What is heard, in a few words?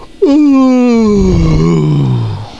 MALE YAWN